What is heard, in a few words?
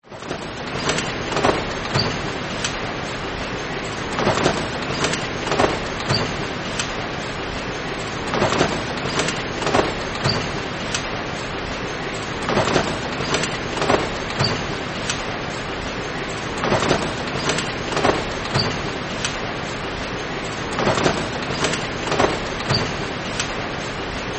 brewery plant packaging equipment